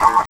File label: Vinyl Fx; Sonic Foundry (Sony Creative Software); Esoterik Beatz